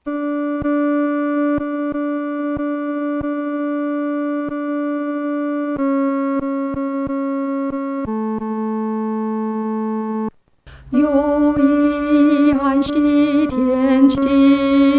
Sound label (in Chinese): 独唱 第二声; 靠近神的怀中